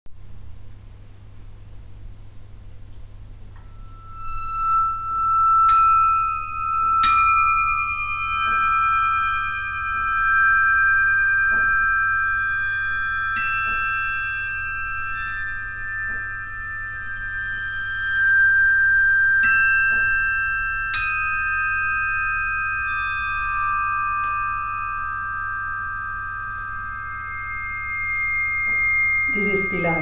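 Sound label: Barcelona, bells; Barcelona, Spain 7:17AM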